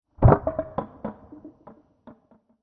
Deep Plastic Metal Underwater Impact Stinger Decay Creeks; Tom Hutchings